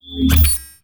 Generator Turn On; Custom UI Sounds; Call of Duty Black Ops 2

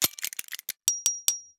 Menu Install Mod Gear; Custom UI Sounds; Fallout New Vegas

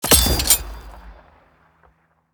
Button Click; Custom UI Sounds; Call of Duty Vanguard

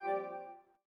Menu Inspector Window Close; Custom UI Sounds; Playstation 4

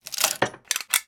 Menu Modding Open; Custom UI Sounds; Fallout New Vegas

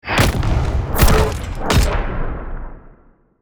Quest Failed; Custom UI Sounds; Call of Duty Vanguard

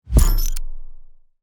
Quest Completed; Custom UI Sounds; Call of Duty Black Ops Cold War

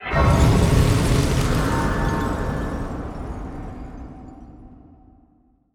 Quest Started; Custom UI Sounds; Elden Ring

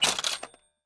Menu Install; Cyberpunk 2077

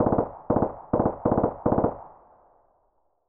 ppsh burst sequence fast; weapons; SPT Battle Ambience

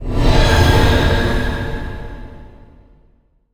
Quest Started; Custom UI Sounds; Dark Souls 3

